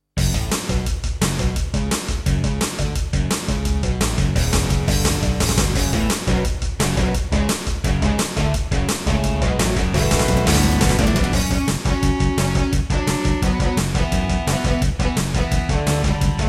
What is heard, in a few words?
Intro, Yamaha DB51XG GS MODE; Duke Nukem 3D